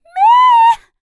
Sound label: Vocal, R&B & Dance, FEM. HUMAN